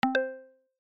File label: incoming message